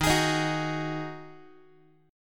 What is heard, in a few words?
D sharp Minor Double Flat 5th, Guitar; strummed; chord; x,11,11,13,10,x